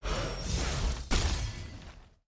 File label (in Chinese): 转场; nba